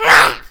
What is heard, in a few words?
child angry roar short